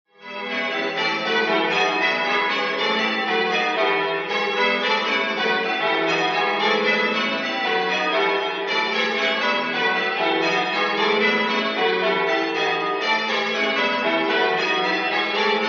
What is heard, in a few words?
Wrotham peal